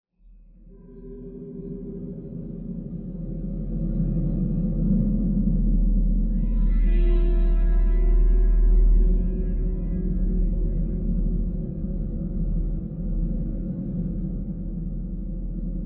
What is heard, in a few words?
drone